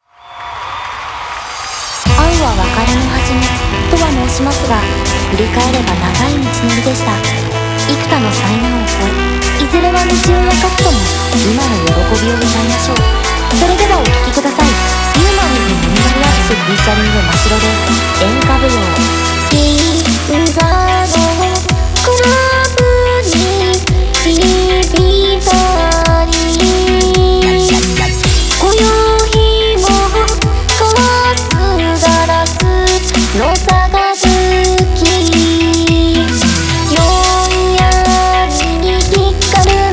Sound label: Enka Dance Music; Muse Dash; Happy Otaku Vol. 3; 演華舞踊